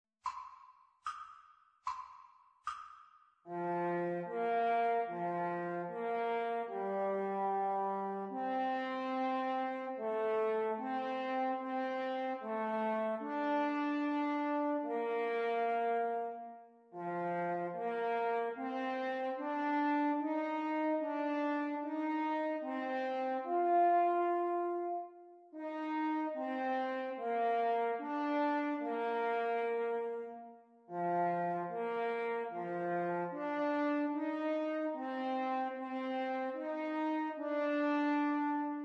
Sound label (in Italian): duetti per corno